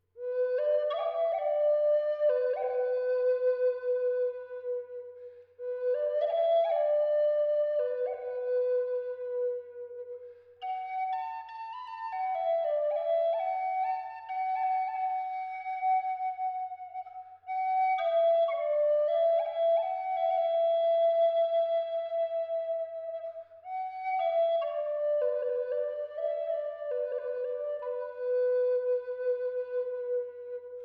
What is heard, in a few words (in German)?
Walnuß C hoch 432 Hz